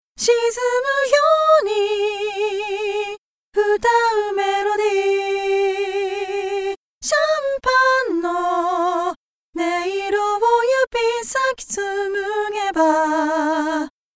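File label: Chorus Breath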